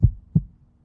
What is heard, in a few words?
Heart Beat - Normal; RadwinIsland